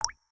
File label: Waterdrop; Samsung; Galaxy A SHW-M100S